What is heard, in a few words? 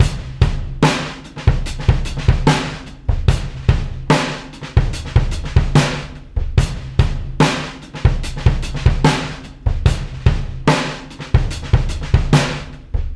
73 bpm breakbeat sample d sharp key, Free breakbeat sample - kick tuned to the D# note.